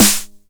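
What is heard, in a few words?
909 SD Tape, snare drum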